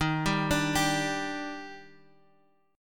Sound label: D sharp Major 7th; arpeggiated; JGuitar